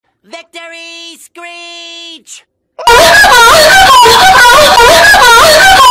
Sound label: victory screech moaning